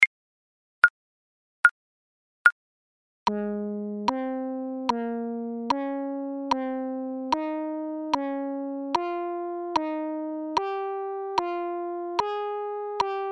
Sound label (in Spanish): Entonacion Intervalos Escala Mayor Tercera